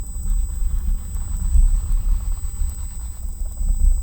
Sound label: Hydrophone